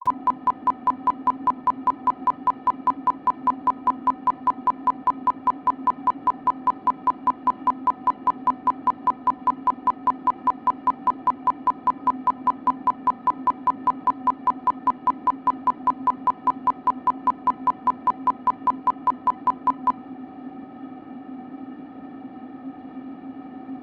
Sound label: berp 32ms 1kHz; S2 trapez RCF Vecchia; Mic1 tubetto; CESI; LaSpezia